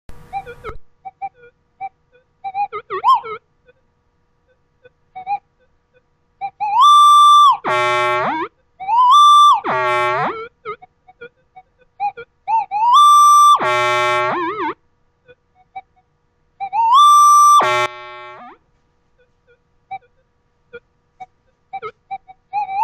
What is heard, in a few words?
minelab sound